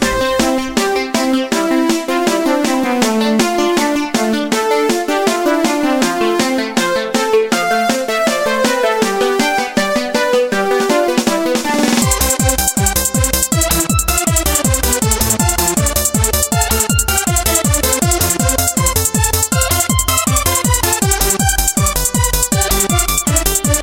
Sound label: Happy Melody